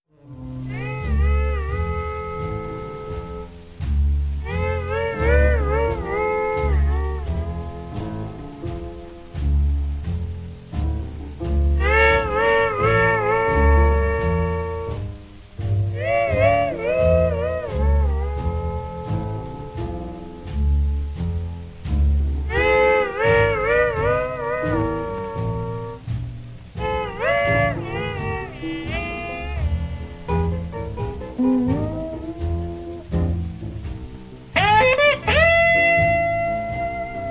Guitar Blues

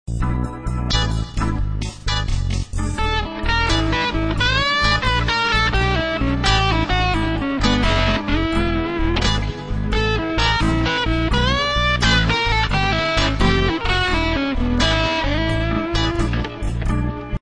blues

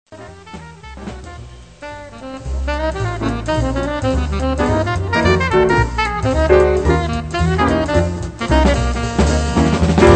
tenor; Jazz Conception for Tenor and Soprano Saxophone

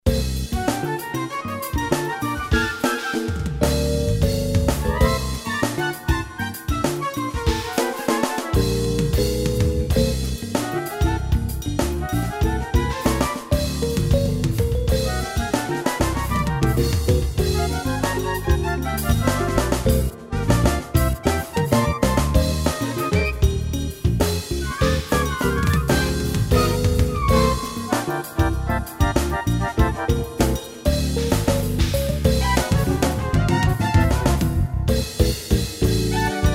afro jazz

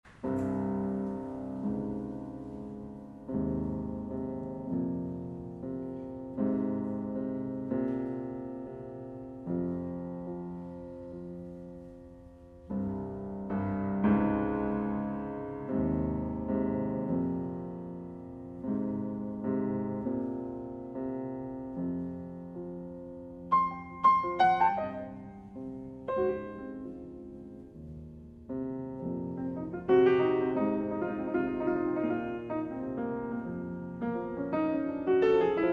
Jazz Solo Piano

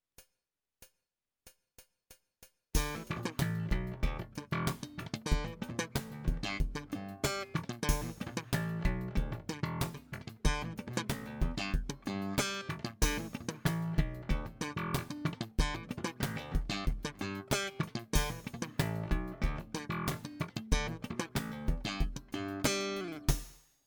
Slap, bassoelettrico

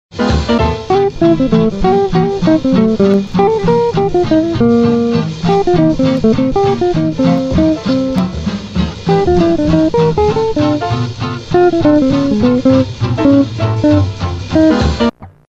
blues

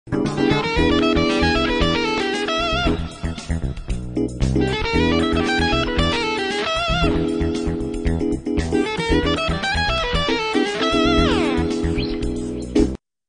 blues

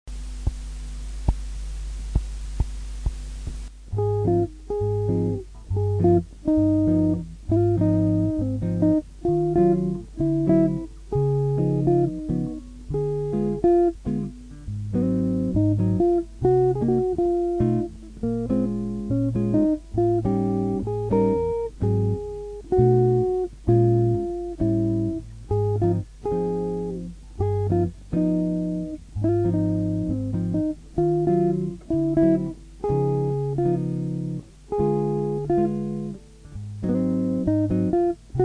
blues

